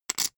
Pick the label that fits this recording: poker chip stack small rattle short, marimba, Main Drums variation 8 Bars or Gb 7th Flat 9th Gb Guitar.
poker chip stack small rattle short